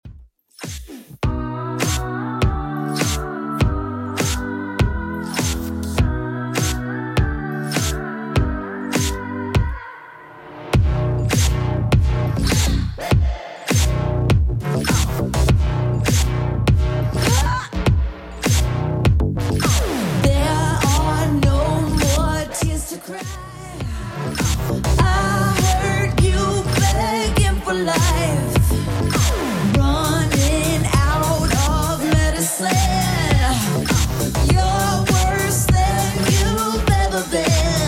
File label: Top40 Redrum 101bpm